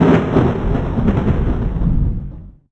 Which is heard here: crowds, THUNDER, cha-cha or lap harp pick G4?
THUNDER